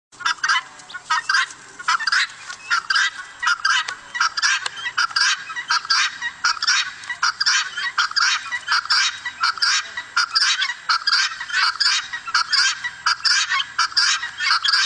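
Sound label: Guinea fowl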